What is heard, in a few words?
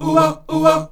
O-WA D#3; Spectrasonics; Heart of Africa 2